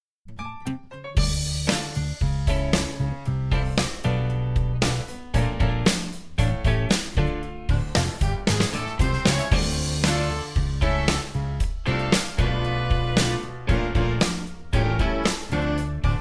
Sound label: Go Down Moses Gospel Choir